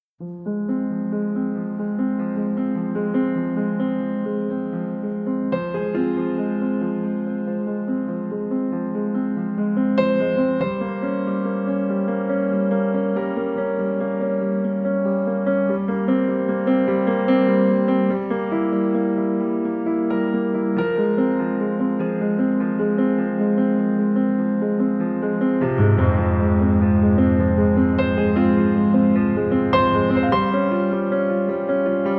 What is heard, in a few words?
solo piano